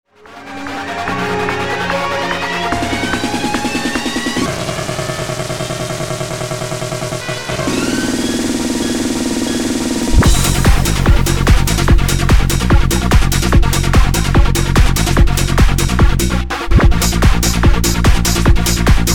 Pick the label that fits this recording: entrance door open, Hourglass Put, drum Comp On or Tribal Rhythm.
Tribal Rhythm